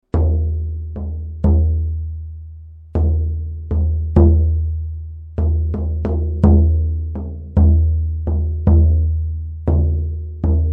buben shaman

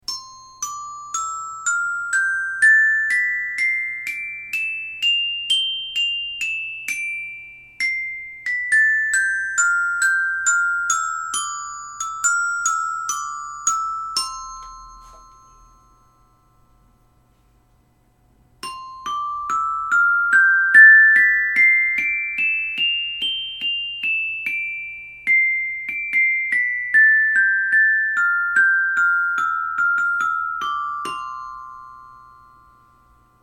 metallofon

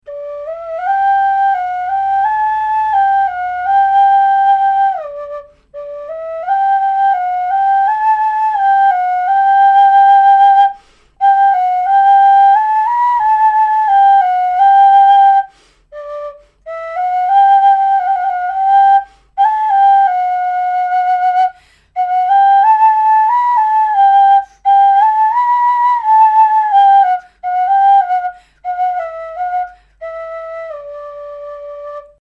sopel yasen re

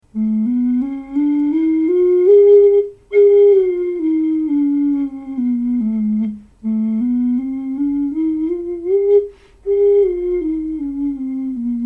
okarina bolshaya; muzykalnaya; ok big

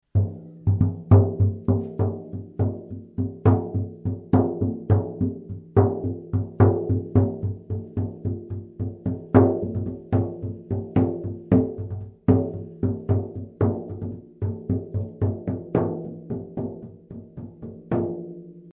buben khakass chark

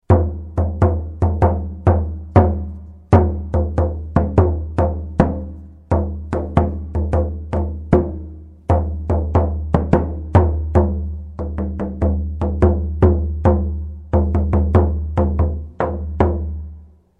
buben

